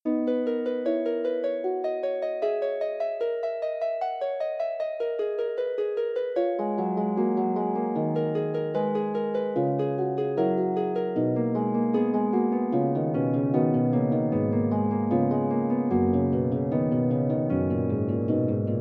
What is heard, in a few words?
prelude, Harp